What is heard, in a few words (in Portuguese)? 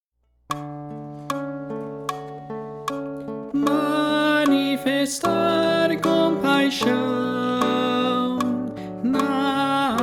Contralto